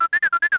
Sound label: vox, droids robots